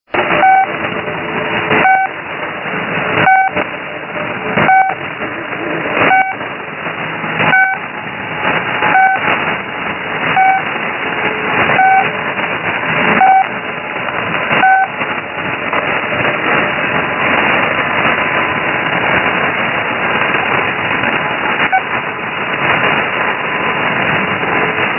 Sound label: malfunctioning marker, Russian military voice stations; S32 - Squeaky Wheel